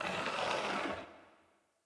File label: Piece Move; UI; Minigames; Ur